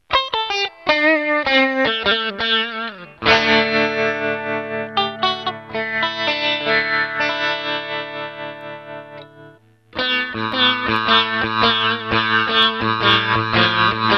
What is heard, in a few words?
pink sitar